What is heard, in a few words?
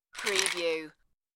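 Ice In Glass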